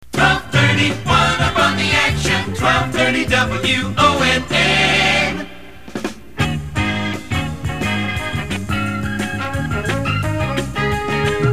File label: jingle one up on the action; WONN